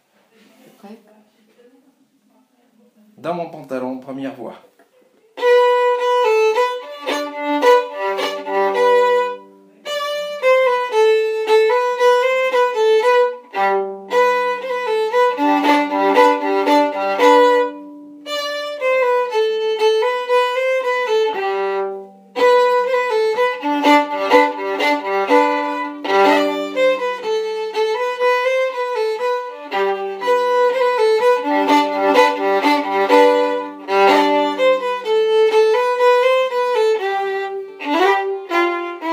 Voix I, violons

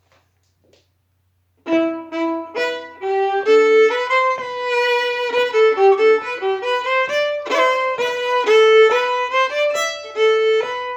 Scottish bord de l eau, violons

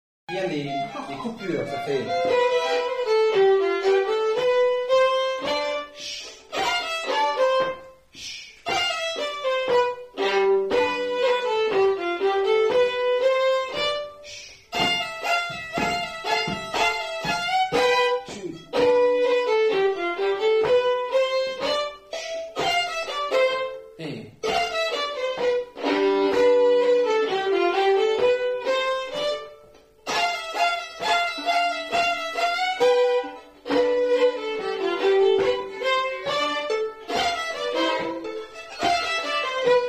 Voix 2, violons

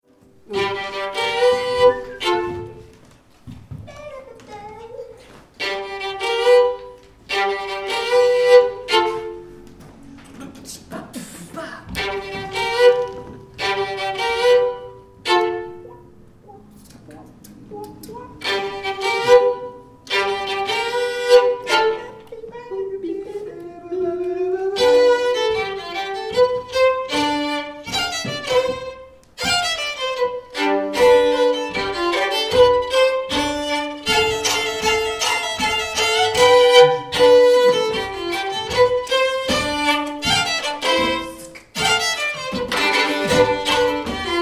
violon; Sauttich Mon Pantalon

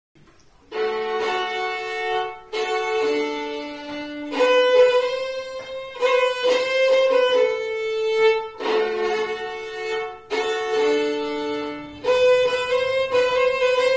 coups d archets voix 2; Monneray

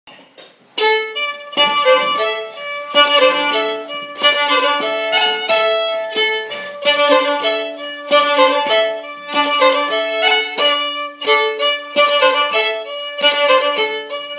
Polka lent, violons; guignochee

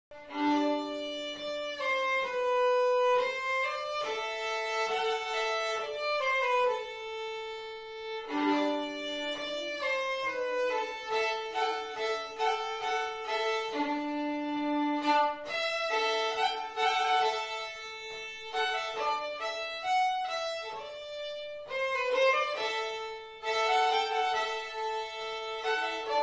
accompagnement, violons; Romain I et II; Coline

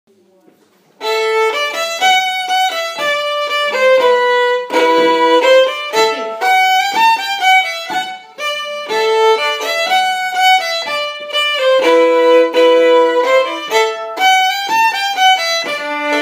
Voix I, violons

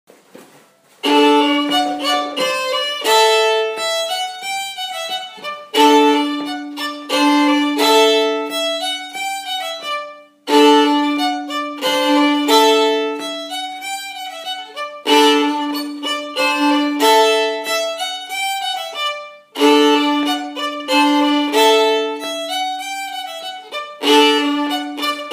Polka A lent, violons

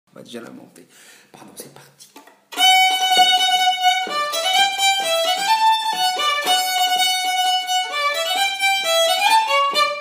Polka, violons

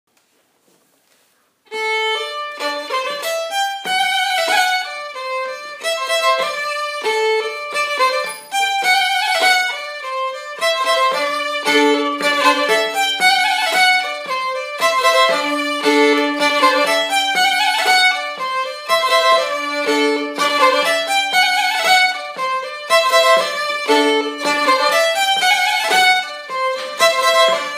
Polka, violons

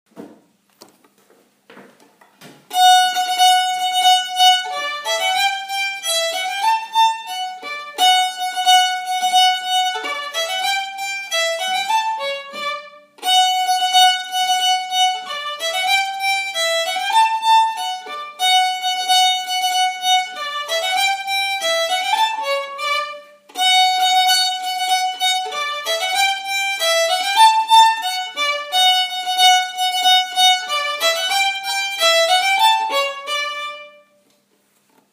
Polka B lent, violons

